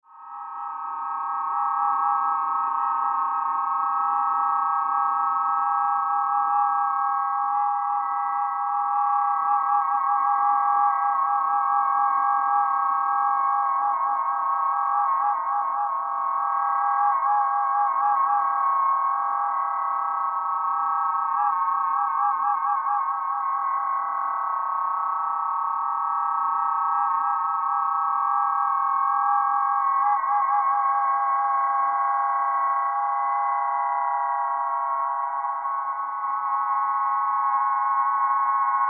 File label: dobro, aeolian; Aeolian harp with 3 Dyneema String and a mechanical / resonator of Dobro guitar; C'est une harpe éolienne composite / entre la harpe éolienne "classique à boite / résonateur et la longue harp monofil; Expérimentations diverses et en cours. Current various experiments